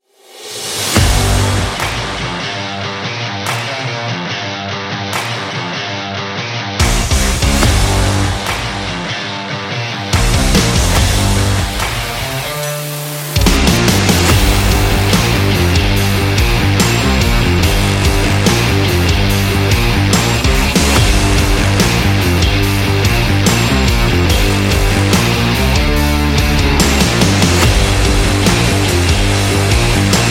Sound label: Active Rock Music, high-voltage rock